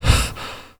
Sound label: breathing; Call of Duty Modern Warfare